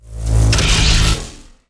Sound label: wild magus doom cast